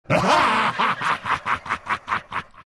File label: shadow demon laugh; dota